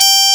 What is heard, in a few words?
muted, SC55 brass